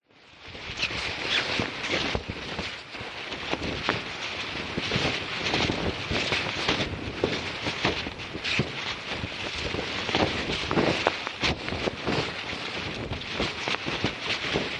vehicles boat sailboat yacht large sails flap wind; zapsplat